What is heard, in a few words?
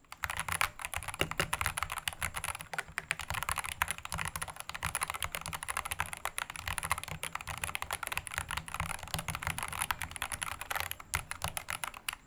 recording louder, optical; optical vs mechanical